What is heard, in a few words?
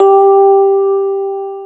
JV SENSITIVE, JV-1080, PIANO